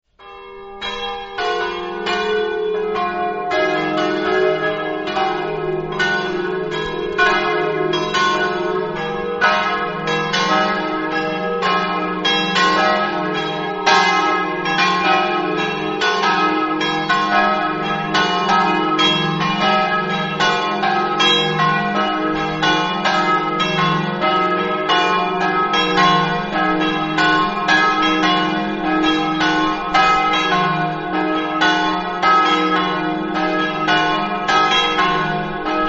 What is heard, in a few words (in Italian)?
silvella, campane